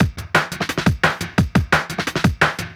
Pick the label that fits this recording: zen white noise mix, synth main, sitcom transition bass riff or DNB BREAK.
DNB BREAK